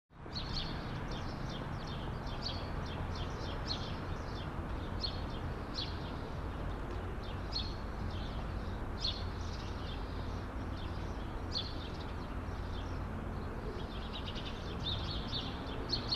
Morning Suburb Traff, foreign

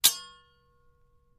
Sword Clangs Sword, swords

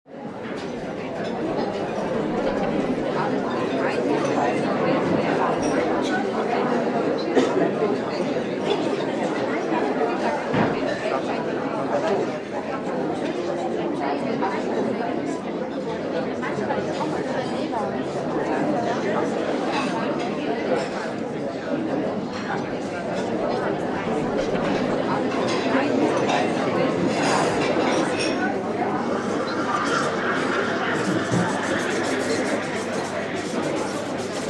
Austrian Cafe Atmos

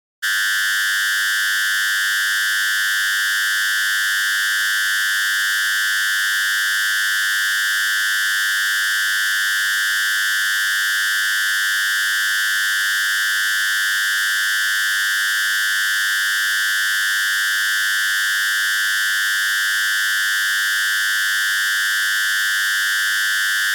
Laser 3 Highpitched

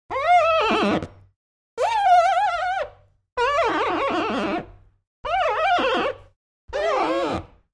Glass Friction

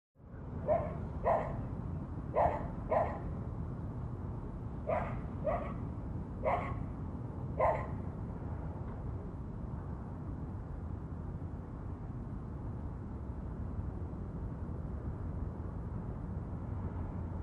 Night Suburban Dista, ext various

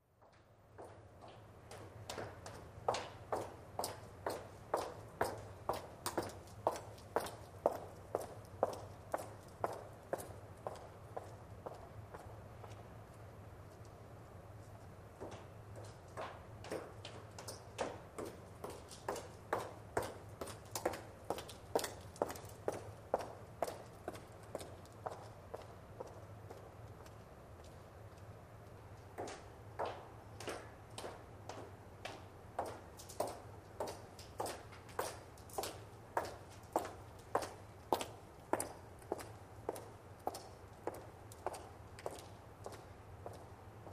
High Heel Walk Bys, high heels

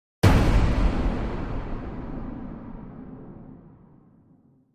Impact Hard Metallic

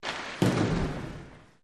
Ice Hockey Hits & Impacts

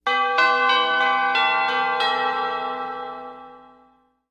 Music Logo; Tube Bell Chime For Announcements.

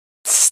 Air Release Shrt His

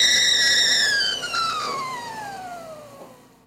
Tea Kettle Off

